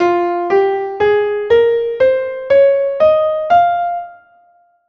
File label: Matthean scale F minor